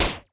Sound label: Retro Shot; blue archive